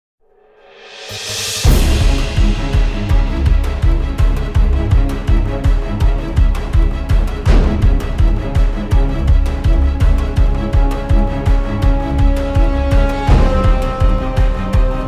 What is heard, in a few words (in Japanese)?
戦火